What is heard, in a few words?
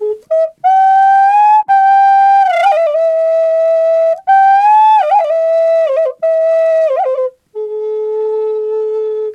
A WILD, FLUTE WILD; Big Fish Audio - Didgeridoo and Other Primitive Instruments